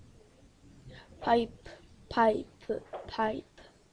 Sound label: pipe